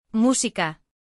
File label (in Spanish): musica